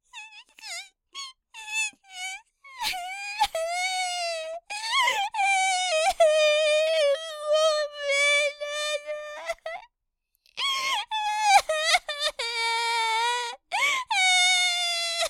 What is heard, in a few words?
cry